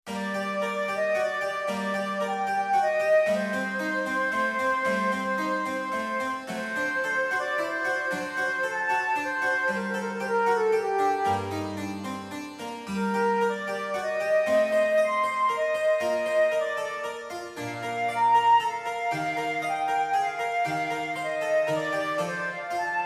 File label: Flute Sonata